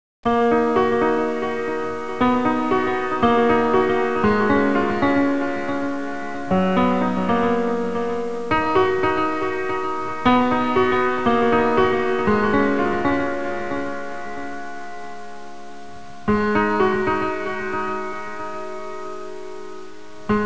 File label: piano